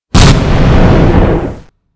plane explode

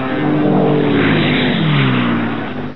prop flyby

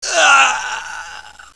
die

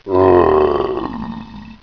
zombie die

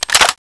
mauser clip in

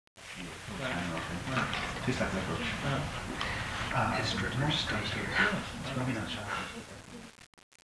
training walla

